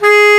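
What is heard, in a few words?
Bass Clar mf G#2; Digital Sound Factory Virtuoso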